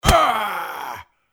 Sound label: dying; Keep Out! A Dungeon Adventure for the Brave